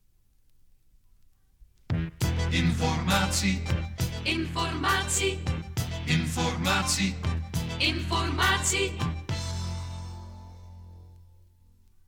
topformat maxi jingle single

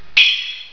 TING; Zoemtonen hisses pingen woesjes tikjes

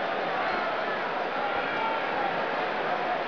crowd distant loop

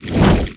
whoosh

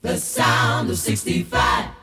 jinglepackage; radioarchief; topformat LP jinglepackage 2

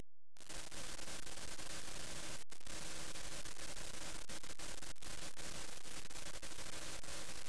destry loop

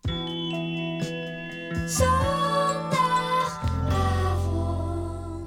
jinglepackage; topformat LP jinglepackage 1

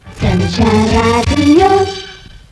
sun Shine Jingle; SunshineJingles

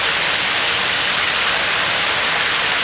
surface water loop

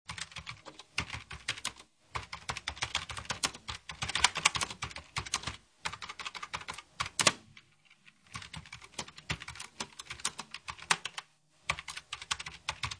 typing; Zoemtonen hisses pingen woesjes tikjes

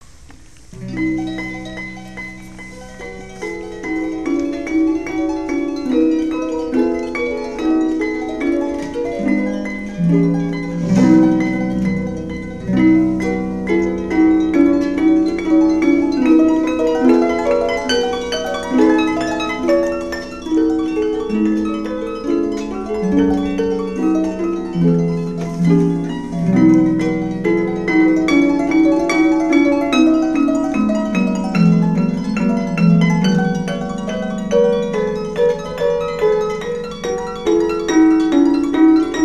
Harp, Holophonic Music; Prokofiev; Holophonic Music and Sound Effects

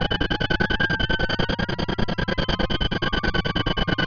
pod binder steady loop

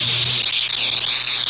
welding torch

